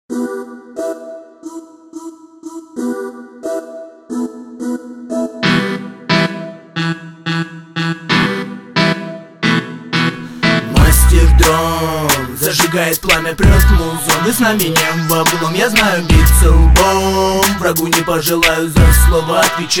dron

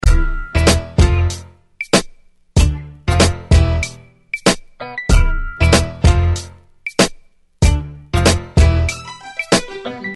instrumental

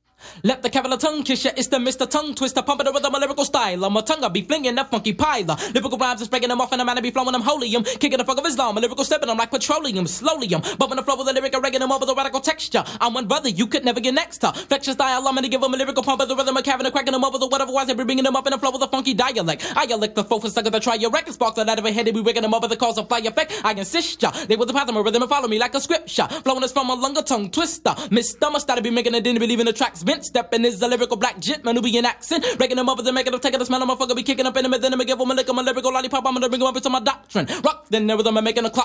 acapella

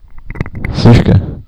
screaming night

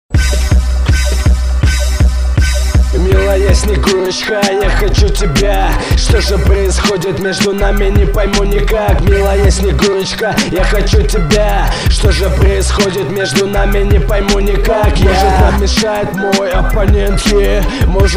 rnb

